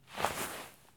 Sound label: stop grass low; Escape from Tarkov